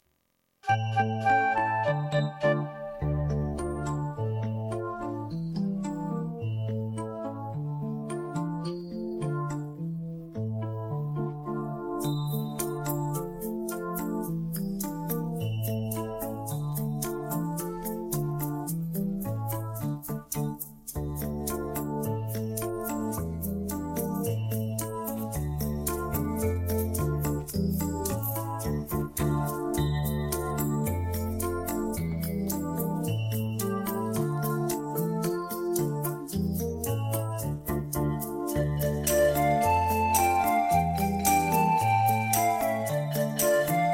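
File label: backing track; vyidi vyidi solnyshko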